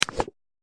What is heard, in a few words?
close